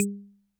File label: Perc, classic machines; arranger